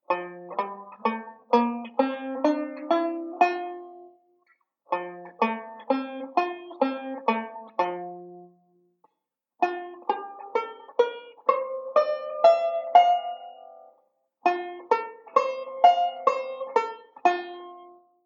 F Octaves; Beyond Chord Melody Volume II

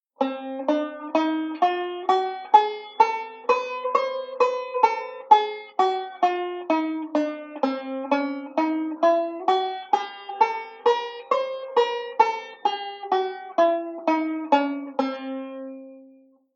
Diminished Scale, banjo; Beyond Chord Melody Volume II

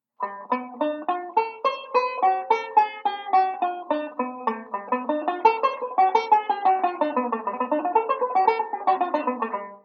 ii V Speed Drill, banjo; Beyond Chord Melody Volume II

